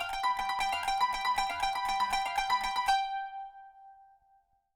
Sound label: kanun